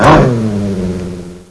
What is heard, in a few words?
zombie dog attack; cstrike; zombiebot